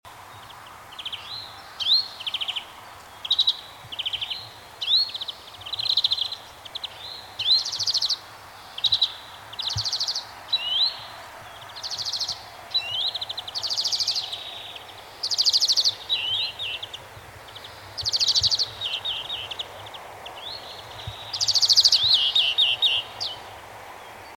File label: Greenfinch